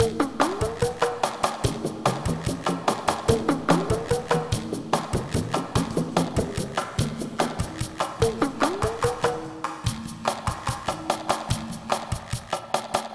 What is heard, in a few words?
Jungle Vill Perc, Lounge Drumz